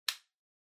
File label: Light Switch American Toggle Dry Mid